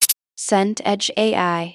ui slider detent

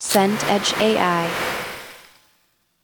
deep fry basket

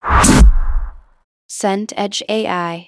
whoosh gritty

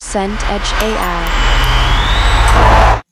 truck pass heavy

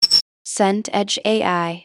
chirp ui

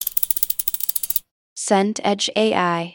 wrench ratchet

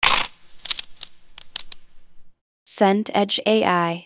ice crackle drink

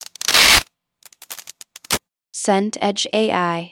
clingfilm pull

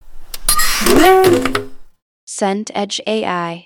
unlock soft

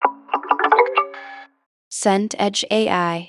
marimba run

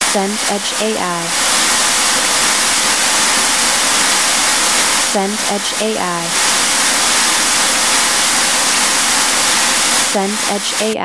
rain heavy

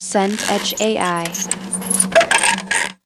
wheelbarrow roll

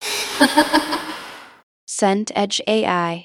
horror child laugh distant

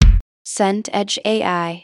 kick tight

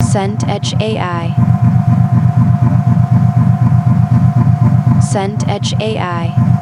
bed generator

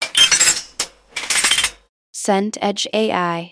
ladder extend

